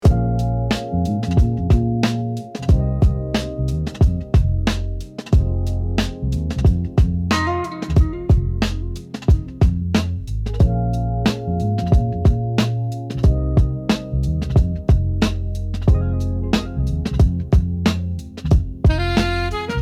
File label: Boom bap rap beat